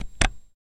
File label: Beeper Switch Click; switches